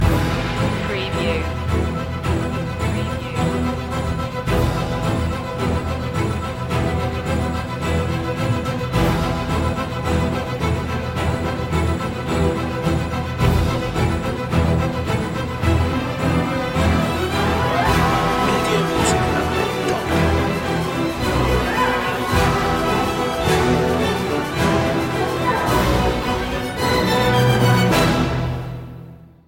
no choir

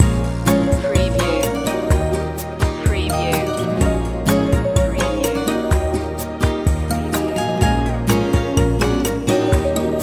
calypso night loop

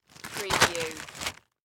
Plastic Bag Insert

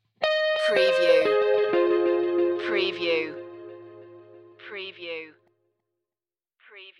night owl stinger

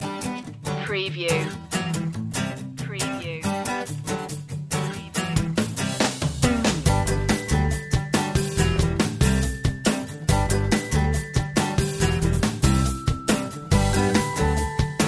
building momentum loop

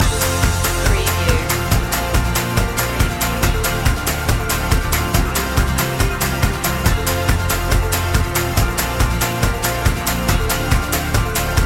upbeat country fun loop

